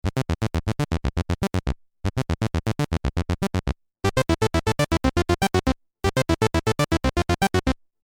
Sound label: evolver subtractor